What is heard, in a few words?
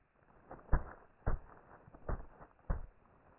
deep footsteps; Five Nights at Freddy's; FNAF 4